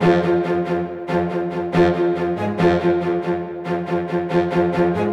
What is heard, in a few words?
Cello; yager windows xbox gamerip 2003